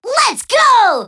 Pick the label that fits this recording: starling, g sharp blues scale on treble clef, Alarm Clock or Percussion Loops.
Alarm Clock